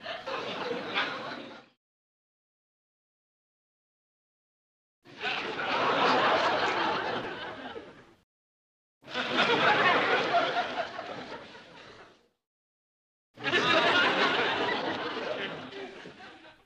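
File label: Crowd 40 Medium Bursts, small int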